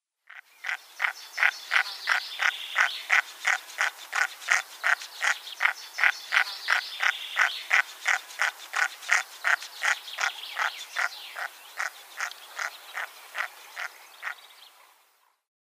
Red-breasted Merganser female

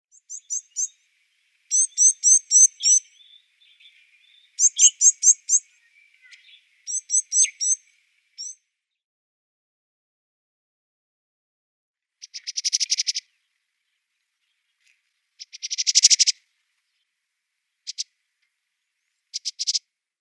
Red-cheeked Cordonbleu calls

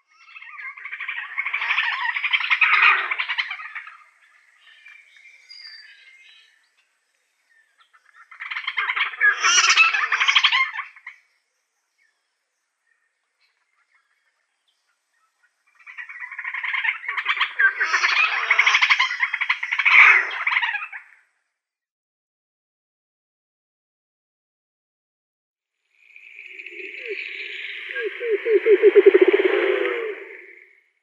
Black Crake calls

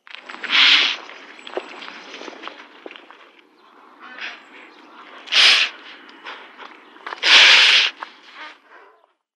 Ostrich young

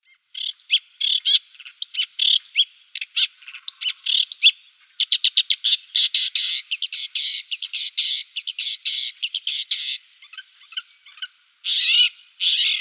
Woodchat Shrike song

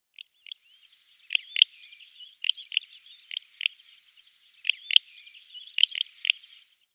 Red-rumped Wheatear calls

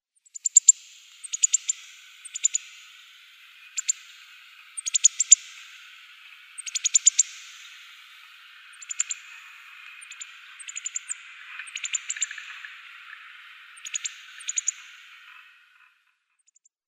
Rock Bunting calls

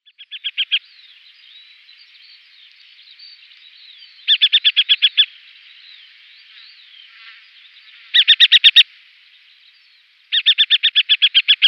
Hen Harrier female

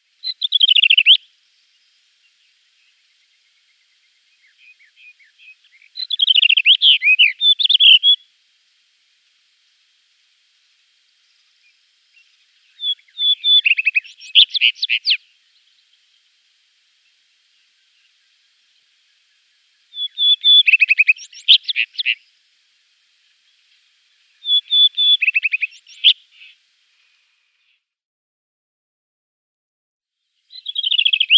Rufous-tailed Rock-Thrush song

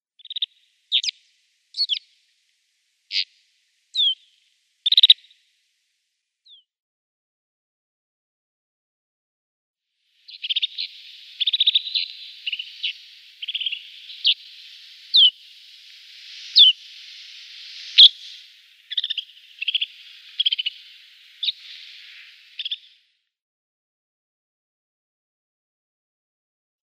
Snow Bunting calls

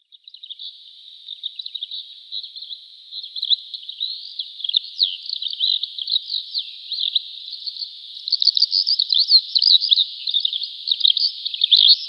Razo Lark song

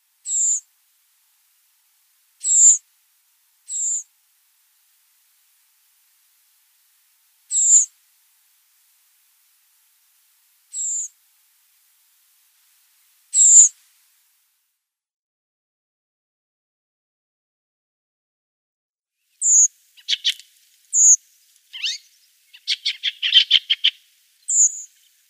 Redwing calls

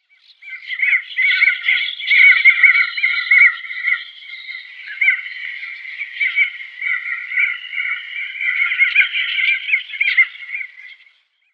Crested Auklet

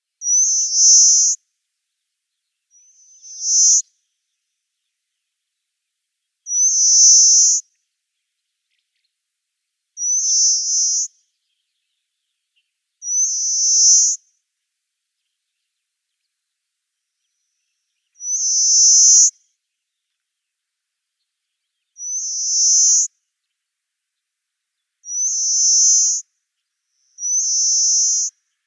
Pale Rock Sparrow song

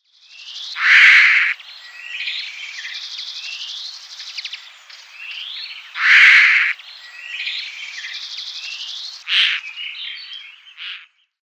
Eurasian Hoopoe calls

